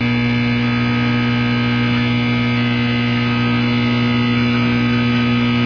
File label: flour light loud, ambient; Source Engine; cstrike